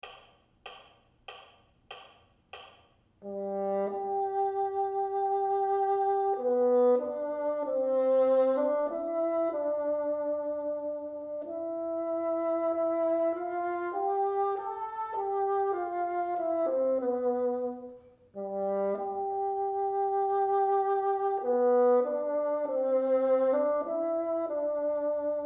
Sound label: teacher, bassoon